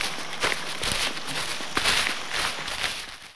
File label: spid move